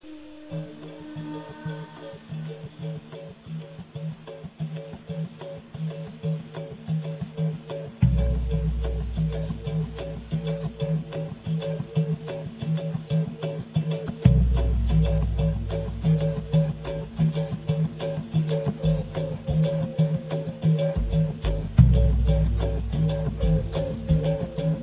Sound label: trance